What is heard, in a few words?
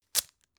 tissue open hard